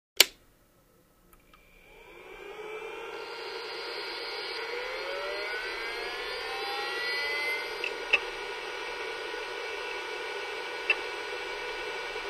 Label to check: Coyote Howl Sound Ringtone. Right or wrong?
Wrong